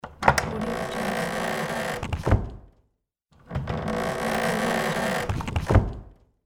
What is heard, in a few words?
open wooden treasure chest; ikosonik